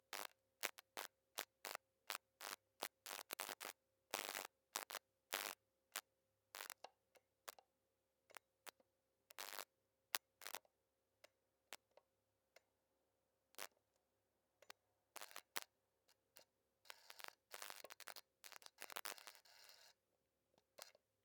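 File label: Faulty Light Switch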